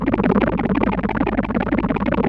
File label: Korg PS3100 G 2; Back In Time Records; Korg Universe VOL-1